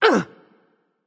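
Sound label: grunt attack, female; smite; voicepack; hera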